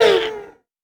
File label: Monster Hurt